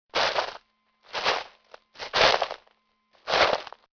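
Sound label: shagi na snegu, footsteps on snow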